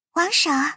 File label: Notification; OnePlus; Ace 6T; Genshin Impact; Kamisto Ayaka